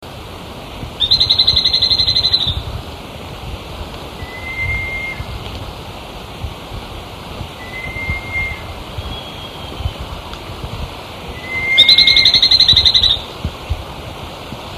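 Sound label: bicolored antpitta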